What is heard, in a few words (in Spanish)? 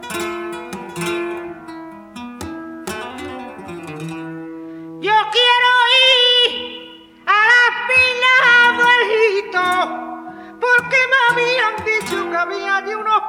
Solea, flamenco